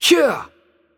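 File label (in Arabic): Attack Heavy, Arabic; ar AE; VO; bloom minigame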